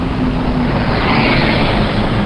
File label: traffic